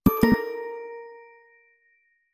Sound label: sms, notifications; Oppo Find X5; sms master